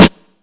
deep acoustic snare a sharp key

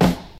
tight acoustic snare sound b key; snare drum samples

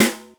good acoustic snare A key

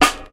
smooth acoustic snare d sharp key; snare drum samples

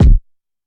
wet kick c key

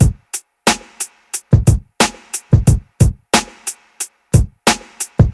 90 bpm high quality drum beat G sharp key; drum loops

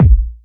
bass drum sound a key; kick drum samples

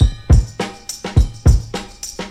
104 bpm drum beat g sharp key; drum loops

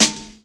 rich top end snare drum sample g sharp key

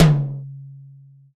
high floor tom drum one shot d key

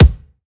kickdrum sample c sharp key

